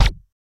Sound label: Gun Shot Silencer, silenced singles